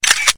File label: Camera shot; ZTE Rio-II